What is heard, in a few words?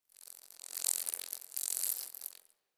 Insect Fly By; Warframe; Infested Eris; Ambience; Outdoor